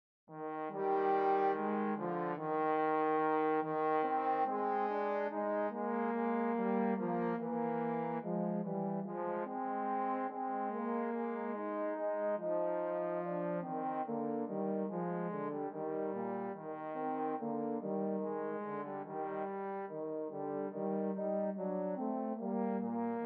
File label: rest in the lord trombone duet